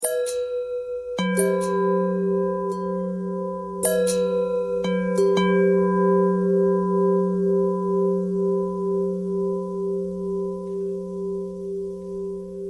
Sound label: bell long bell thread simple, bells